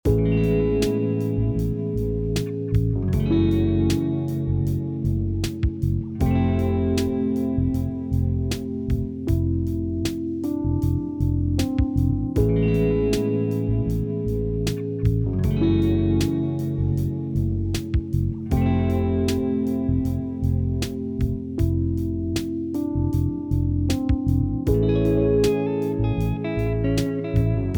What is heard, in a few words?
Rock Groove